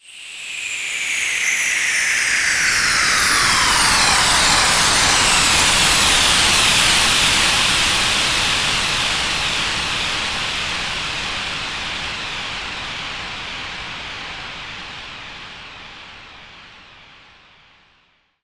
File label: Ultimate Sweep; Zero-G; Soundclash Nu Dance Electro; Acidized Wavs; FX Atmospheres